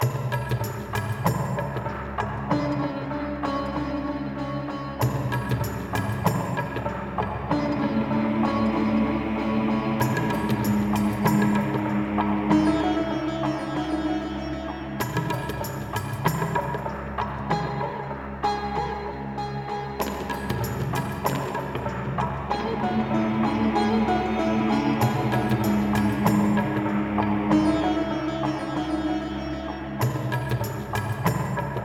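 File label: Catacombs Ambient, game music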